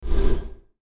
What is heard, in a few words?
Short Swoosh